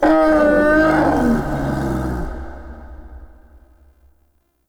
Stag Vocal Pain Long

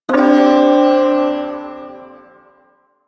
Shawzin Pent Maj Chord; Warframe; Duviri Ersatz Shawzin

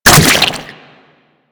Infested Moa Slow Bomb Fire; Warframe; Attacks

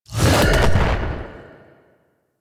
Edge Lift; Warframe; Pacifist

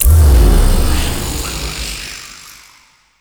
UI Corrupted Pillar Spawn; Warframe; Zariman; Survival

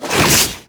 Inf Transform Claws Whoosh, Infested

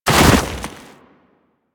Land Soft Deep Snow, Footsteps; Warframe; Operator

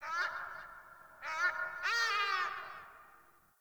Day Bird, ambience; One Offs; Glades Of Andurin

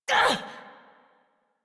Pain Short, Adult; Warframe; New War; Adult Operator

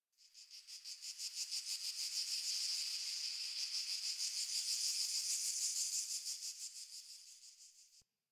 Insect Day

